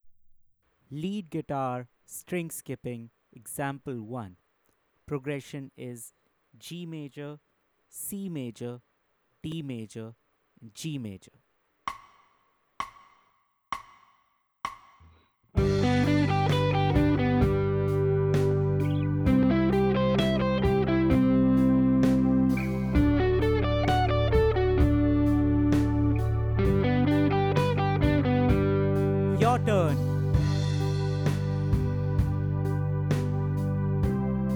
String Skipping Playthrough Slow Speed, lead guitar; string skipping arpeggios; The Art of Lead Guitar